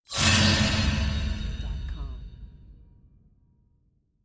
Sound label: big sword impact